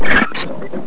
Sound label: pulley; dosgames; roc